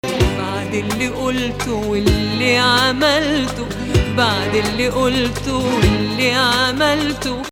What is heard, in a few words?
Hijaz; Hijaz 1